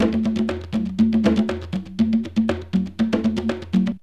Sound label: JUNGL 120, percus loops